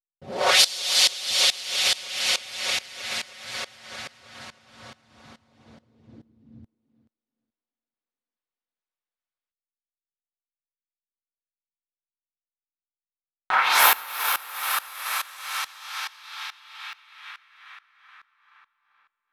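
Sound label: FX Noise Sweep, 140 BPM; Vengeance Samples; Song Kits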